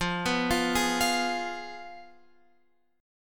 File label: F Diminished Guitar Open F; x,12,11,12,11,12; Fdim arpeggiated; JGuitar